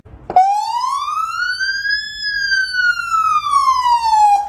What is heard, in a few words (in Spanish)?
sonido ambulancia